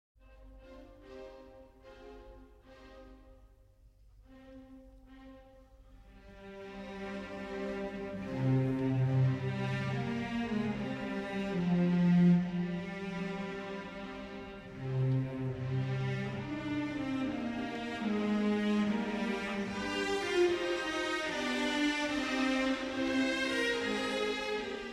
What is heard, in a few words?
Symphony in E-Flat Major, Andante; Symphony No. 4; WAB 104; Romantic